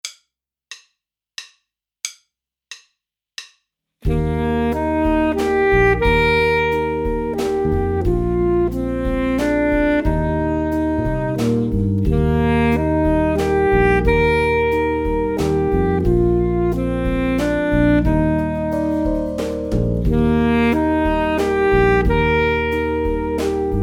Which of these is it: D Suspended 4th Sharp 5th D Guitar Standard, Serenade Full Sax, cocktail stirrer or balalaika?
Serenade Full Sax